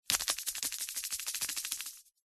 foley small plastic sachet of salts or other shake